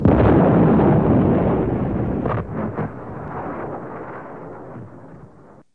rocket launch; Sound FX Real Life

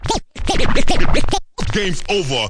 turntable, Scratching and Turntablism

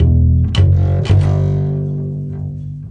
165 acbass rattleup, BASS LOOPS

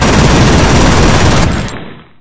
machine gun out; Sound FX Real Life

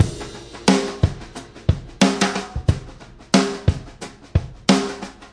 swinging brush snared groove, 090 bpm, drum loop

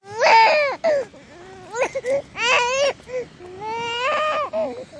baby crying; Sound FX Real Life

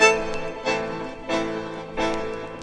091 accordion hits; SAMPLED MUSIC LOOPS

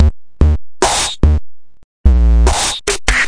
073 dirty deep bass, BASS LOOPS

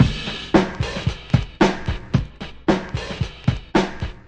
112 dirty lofi funkings, drum loops

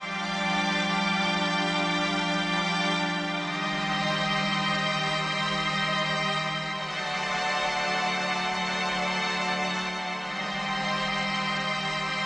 vibra strings melody

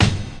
low kick crash; Punches Hits Discoblasts; single hits

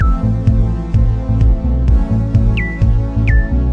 chirpy mellow techno; SAMPLED MUSIC LOOPS

